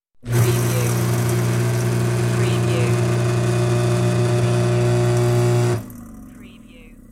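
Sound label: Garbage Disposal Empty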